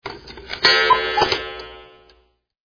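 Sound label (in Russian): завод часов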